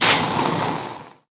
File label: Acid Melt; Golf Blitz 1.12